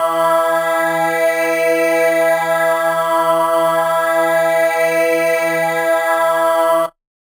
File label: Riddim Melodic Hit - E; One-Shots; Sideways; Ghosthack; Riddim Essentials Volume 2